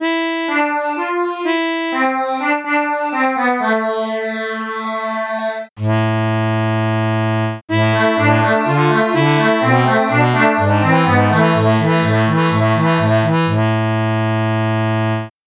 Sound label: bandoneon